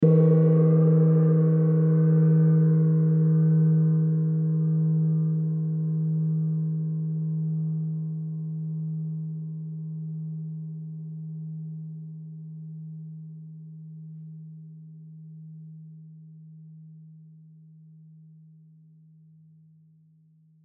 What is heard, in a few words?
Gong Hit Large, Gong Hit Large Warble; Cymbals; Blastwave; Sound Effects:Musical